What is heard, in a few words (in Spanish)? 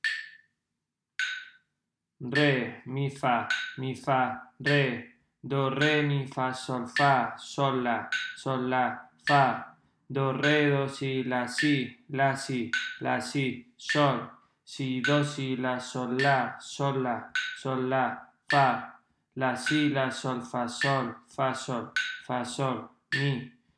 RITMO